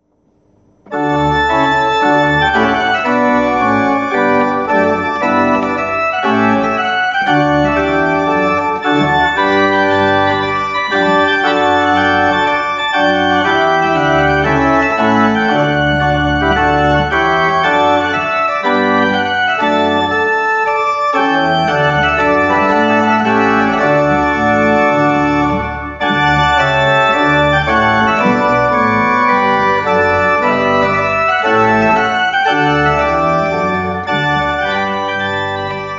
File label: Trumpet Voluntary; John Stanley